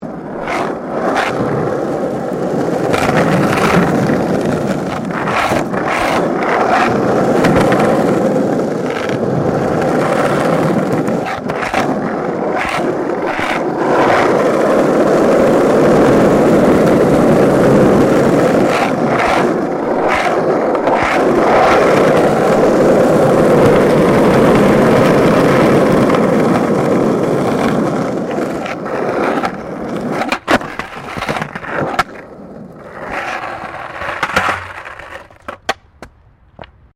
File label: SKATEBOARD UPSIDE ASPHALT MEDIUM; Skateboard sounds; Skateboarding (Sound Effects:Sports); SoundEffects-Foundation4